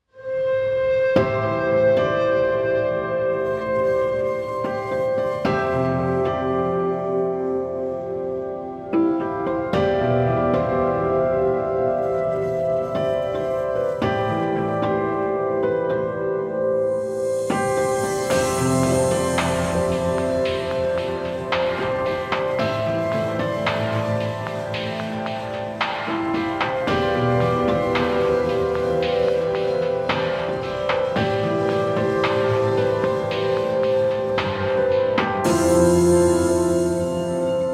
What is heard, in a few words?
Una Corda